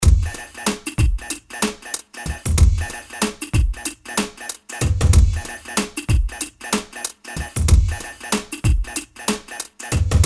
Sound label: Quirky Rhythm